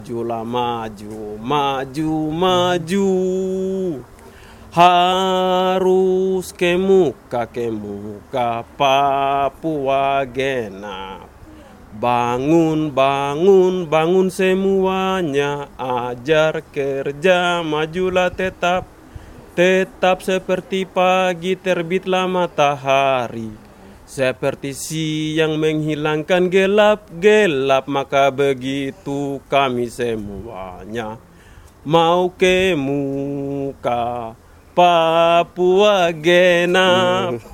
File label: Papua Song